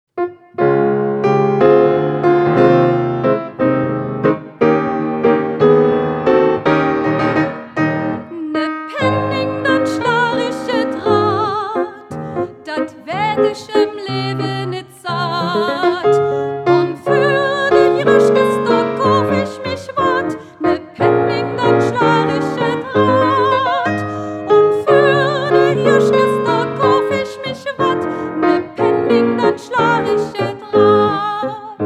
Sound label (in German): Radschlaeger komplett mit Gesang